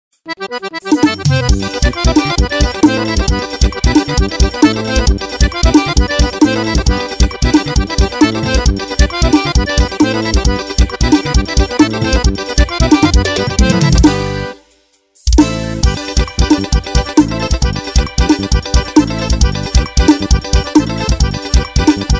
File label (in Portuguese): teclado playback; fim de semana areio de ouro